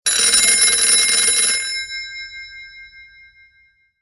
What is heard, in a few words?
ring old phone; HTC Touch Diamond